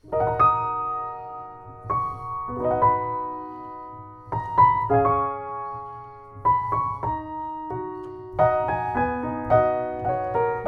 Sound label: Gaveau, gaveau lg66 by schimmel, piano